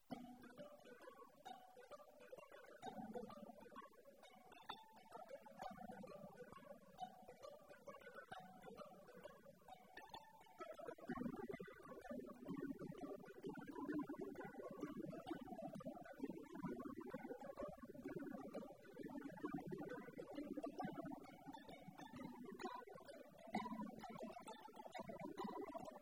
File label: Jig, Harp; Butterfly Jig; Kari Gardner